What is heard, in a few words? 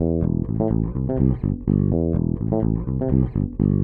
DEEPHOUSE BASS 125 A